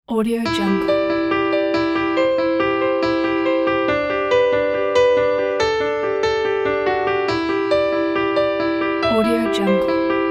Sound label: entrepreneur sonata